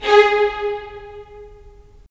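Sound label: strings violins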